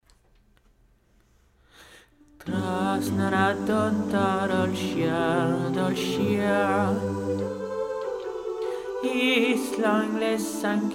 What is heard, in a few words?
tenors; trasna na dtonnta